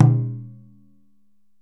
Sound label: DJUN DJUN, African Perc